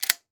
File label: Weapon Gun Shotgun 12 Gauge Dry Fire; AdobeCC